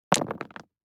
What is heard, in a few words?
DICE on Neoprene, Throw and Roll, Standard, 4 Four Dice; Samples; ArticulatedSounds; DICE Sfx Party (Extended)